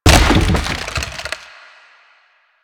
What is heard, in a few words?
Wood Bench Destroy; Ambience; Props; Warframe; Nineteen Ninety Nine